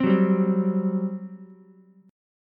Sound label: G Major 7th Suspended 4th, G, down, Ukulele